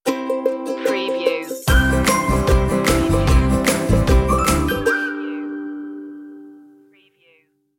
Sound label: stinger